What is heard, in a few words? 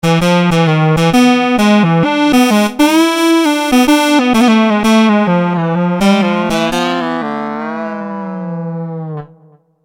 Brassy Lead; V-Synth